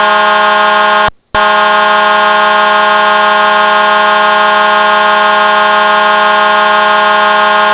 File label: HORN HORN, siren